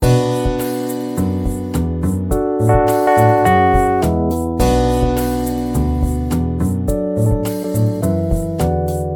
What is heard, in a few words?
Chainsaw Elevator; Toontown: Corporate Clash Soundtrack; Cogs